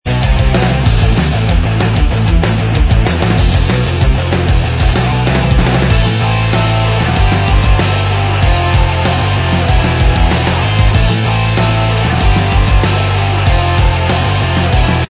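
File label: punked up instrumental track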